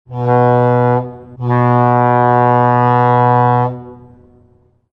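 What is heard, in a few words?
ship horn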